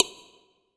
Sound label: Perc